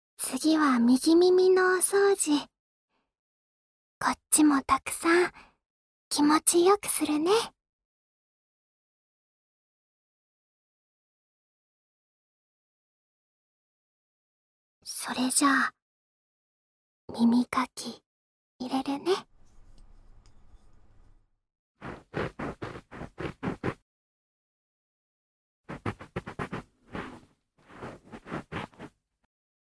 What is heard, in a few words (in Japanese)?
梵天耳かきで右耳の耳掃除; ASMR